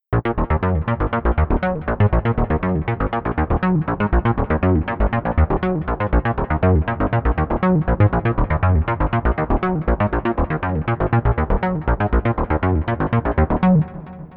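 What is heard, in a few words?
arp 2 octaves groove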